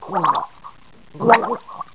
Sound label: gurp; vortex